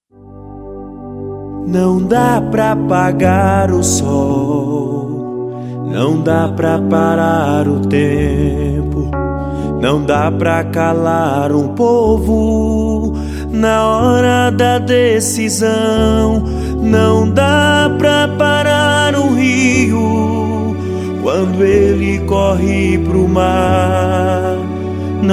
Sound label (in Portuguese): jingle pra marcar a sua campanha